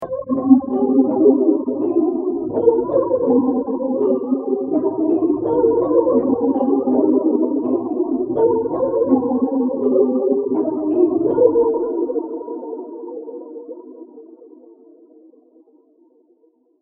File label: ocean ripple electric piano pattern